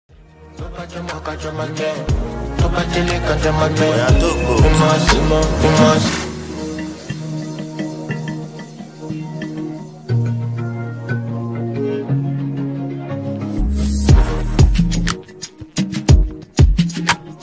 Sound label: Instrumental